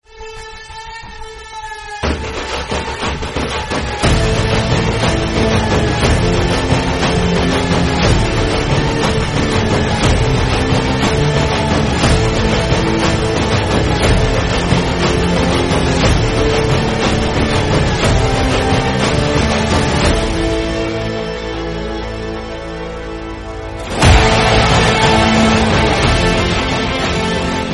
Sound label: trap bgm; kgf 3